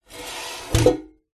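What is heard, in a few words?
sounds of bar stool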